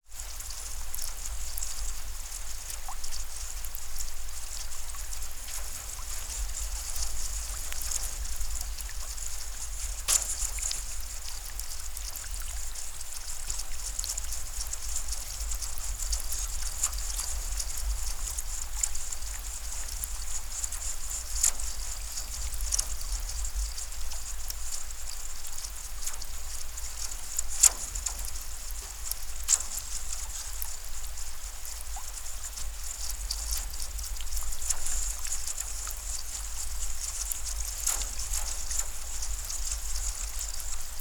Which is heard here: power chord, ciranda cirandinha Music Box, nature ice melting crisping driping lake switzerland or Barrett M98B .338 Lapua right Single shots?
nature ice melting crisping driping lake switzerland